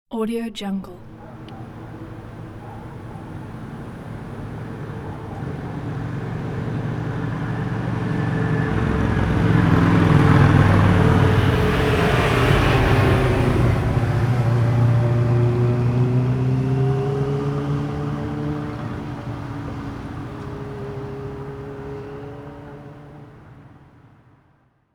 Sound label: group of motorcycles passingby